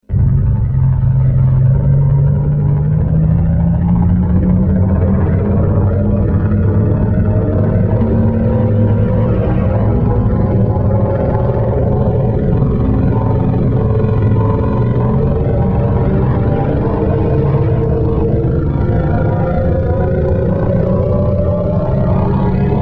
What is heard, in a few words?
Spaceship Taking Off, Ascending Spaceship.; Spacecrafts